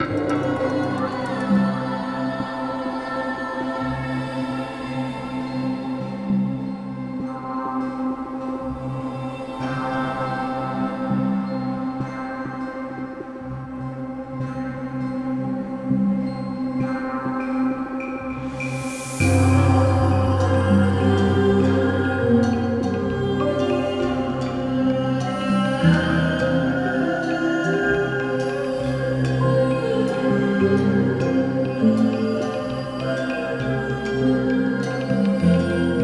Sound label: Relaxation Ambiance Music Meditation; Elevation